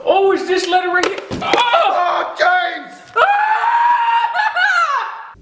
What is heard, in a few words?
Scream